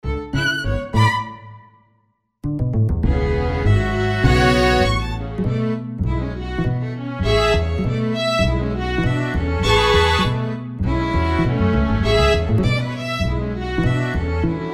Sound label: jazz